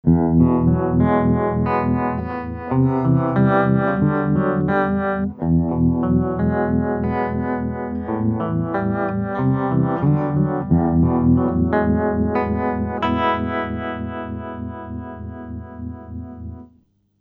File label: Harm Tremolo